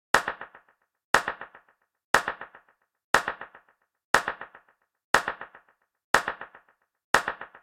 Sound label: Clap, Dancefloor Pop; Remixlive